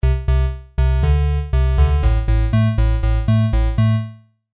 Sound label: Synthesizer parameters Oscillators mixed and tuned; I’ve added more oscillators, used different wave shapes, and tuned the oscillators differently.; tuning the oscillators